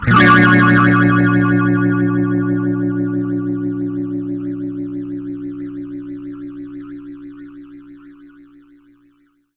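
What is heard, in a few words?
phaser chord, guitar; PlatinumPhattCD2; E-MU Producer Series Vol. 8 – Platinum Phatt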